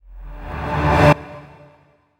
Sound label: DANCE FX